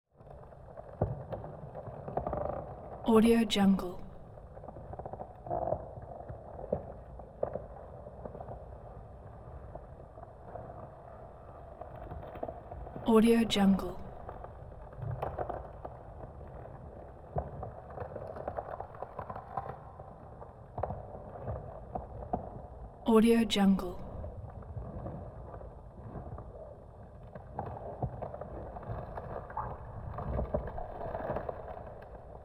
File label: giant vine movement continuous creaking swaying